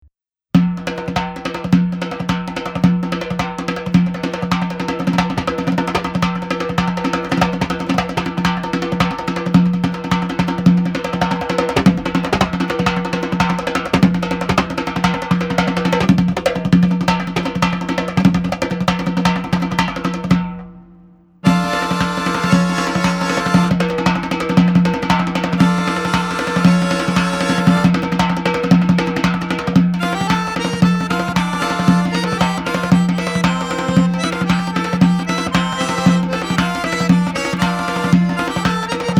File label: azari music